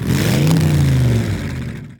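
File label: Rev Single, Monster Trucks; Nickelodeon - Destruction Truck Derby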